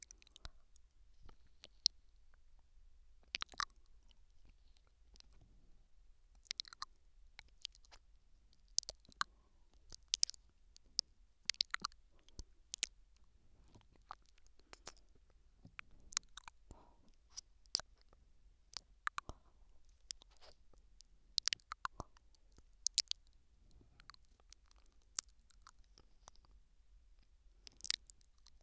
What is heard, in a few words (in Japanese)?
左耳甘噛み